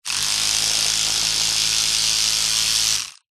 household electric toothbrush on run short off no brushing